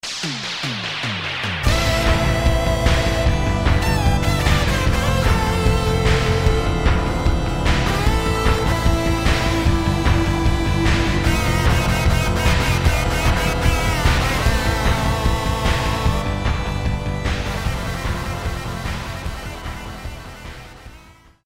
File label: Neighborhood Band Evil